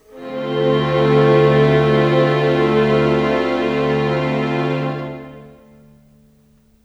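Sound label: Pad D minor 7, Orchestral; Sonic Foundry (Sony Creative Software); Orchestral Series VOL-4 - Rock and Pop; Pads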